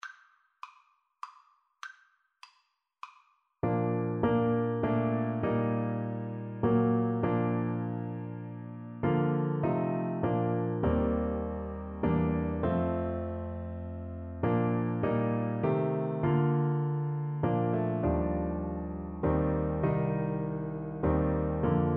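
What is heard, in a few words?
alto saxophone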